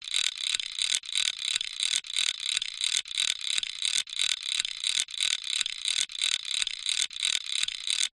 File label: industrial metal tool wind cogs